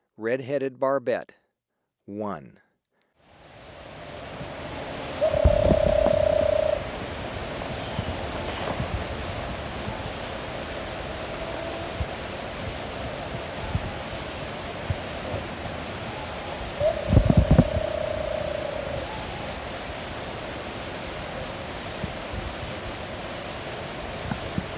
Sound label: Red-Headed Barbet; S. America